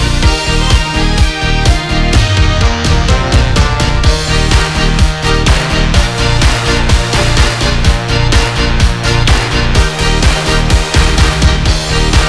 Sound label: Karaoke; 80 Geburtstag